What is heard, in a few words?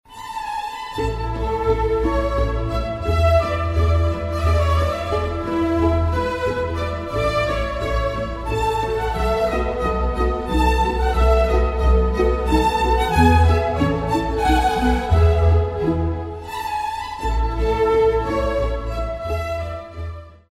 Classical Minuet in Eb